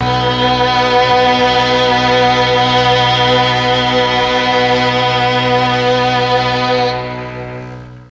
B1 MIXED STR, mellotron; MIXED STRGS

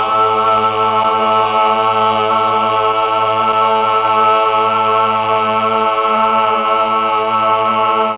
A1 8VOICE CH, mellotron; 8VOICE CHOIR

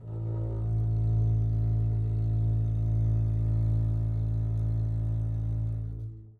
a1 down, bass arco; meatbass

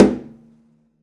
pizz noise body slap high, double bass

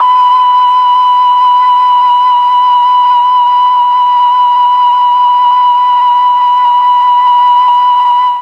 B3 mellotron FLUTE

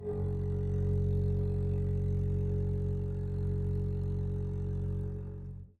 gb1 down, bass arco; meatbass

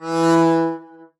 arco e3 ff down, double bass

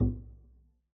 pizz noise slap resp, double bass

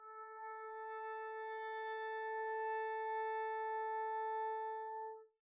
arco a4 pp down, double bass

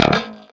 db2 staccato, glowly bass

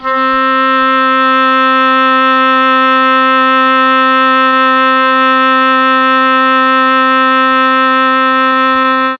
C2 OBOE, mellotron; CHMBLN OBOE

